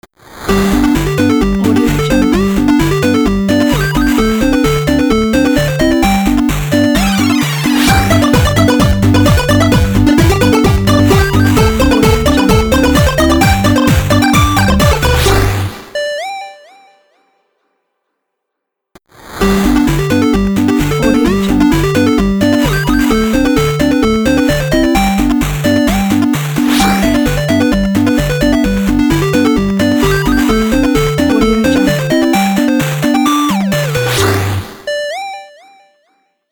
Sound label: arcade 8bit game logo